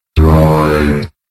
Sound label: Droid slow